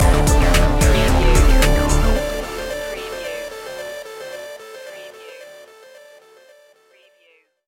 future technologies stinger

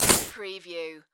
Shovel Dirt Dig; sound effects bible

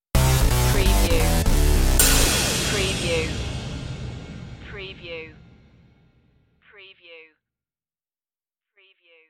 smash tv stinger

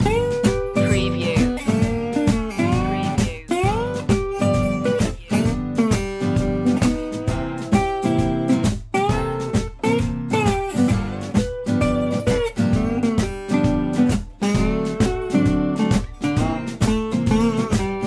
belly up blues loop

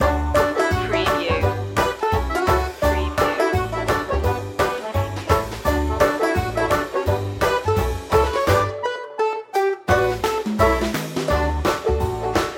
party barn banjo loop

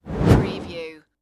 Fire Torch Single Pass By Whoosh

